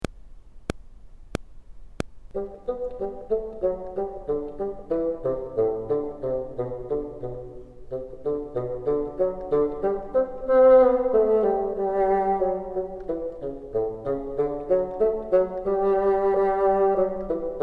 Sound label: bottom, bassoon